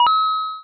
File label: pickup; foss-mmo